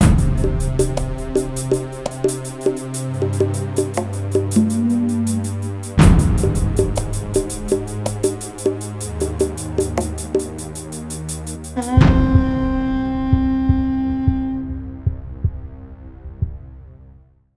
MUSIC LOSE; Survivor 3D The Ultimate Adventure; 3DS

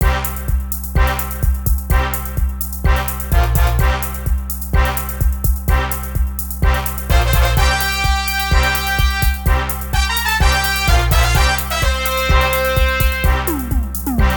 Music event; Power Pro Kun Pocket R

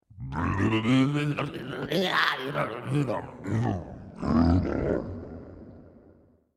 Nasty; Going Under

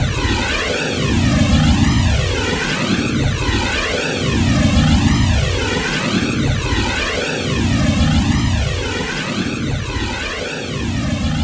Enemy Jet Active Charge Loop

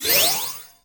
Heal Hp Split; disney star smash android ios mobile gamerip 2020

